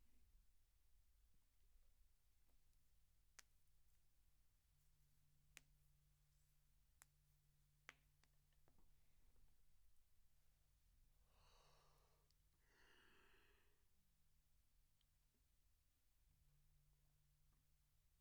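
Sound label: snare top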